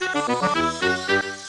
Jingle Boss Victory

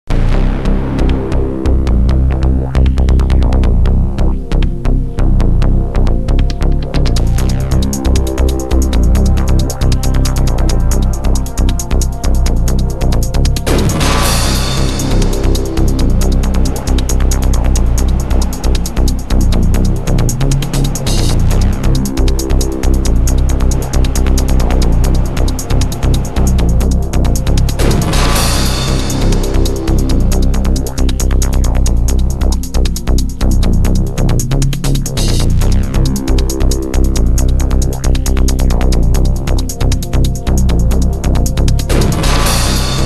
Chopper Attack; xbox rip; Breakdown

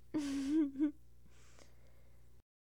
Massage; Bathroom; Hilzartov; Fairy Size Doesn't Matter

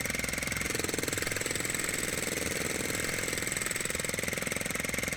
Drilling Loop; doodle jump 2; android

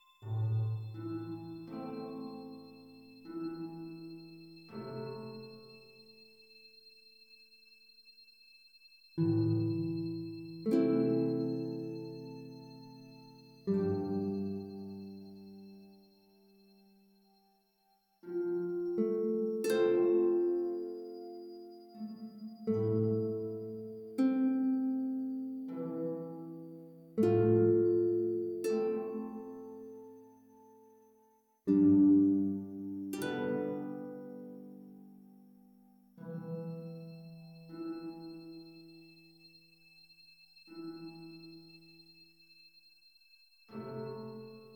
loop Neutral; the walking dead michonne 2 give no shelter

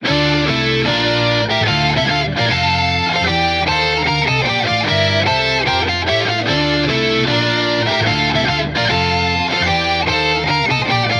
Victory Theme (Farandole); Dungeon Encounters

